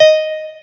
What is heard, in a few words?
piano